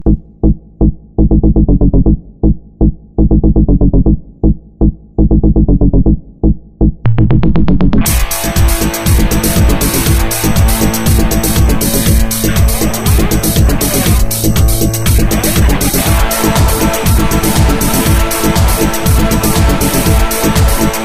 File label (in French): dance floor guitare Mix